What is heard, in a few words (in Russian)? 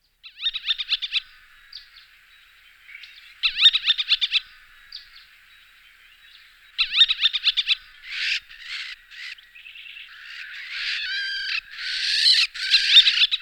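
evropeyskiy tyuvik golos, Levant sparrowhawk voice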